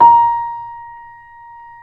YC7 piano, pp; Roland L-CD701; pp YC7 St

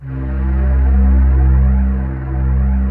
pan pad C2; PANY PAD

